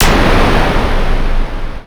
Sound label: explosion